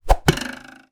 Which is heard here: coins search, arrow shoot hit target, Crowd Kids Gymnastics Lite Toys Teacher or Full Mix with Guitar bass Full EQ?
arrow shoot hit target